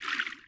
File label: Hammerhead Wade; Playable Tutorial Level